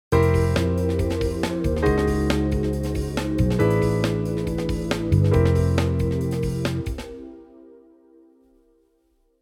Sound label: Natural Eleventh Chords